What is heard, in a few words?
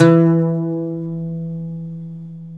OUD E 3